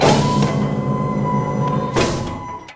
door; doors

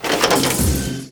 keys; mystic radar

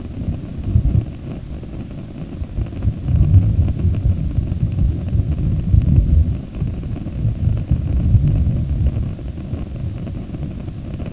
rumble; surf rocks

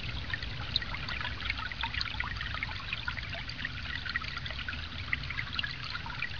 forest creek

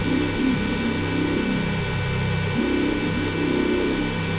lab drone; de mars facility

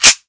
garand reload bolt forward, weapons; svencoop; ww2projekt

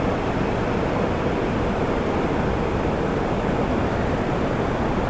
City station

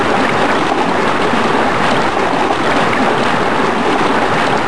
water roar

